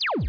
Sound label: Pew; cosmic unicorn